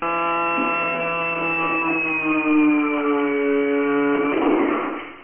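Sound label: gear down; doom; b1900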